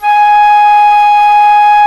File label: flute, C flute